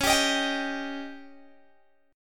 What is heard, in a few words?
C sharp Suspended 2nd Flat 5th C sharp Guitar Open G